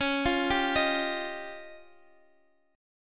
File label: F 7th Suspended 2nd Sharp 5th C sharp